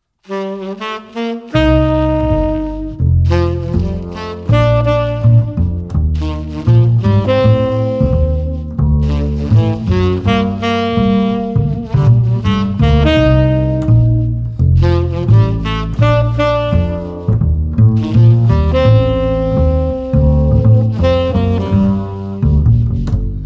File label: ambient ensemble